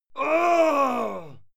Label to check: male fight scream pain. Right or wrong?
Right